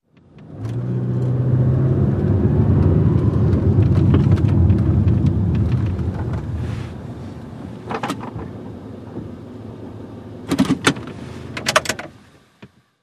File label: Sedan Acc Park Off